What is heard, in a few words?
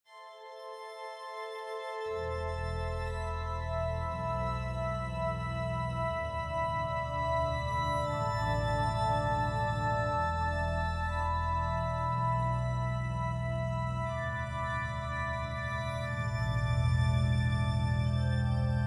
Sad loop